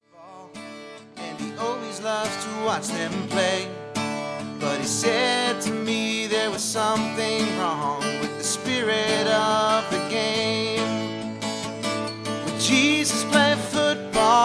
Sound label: country